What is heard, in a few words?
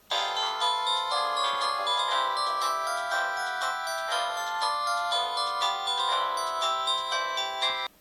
samsung sghe700 ringtone